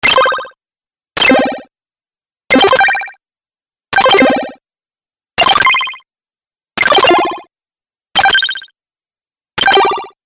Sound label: Arcade 8Bit Robot Fx Sound Collection